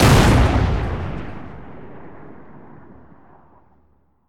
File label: explosion; Weapons; Explosives; Explosions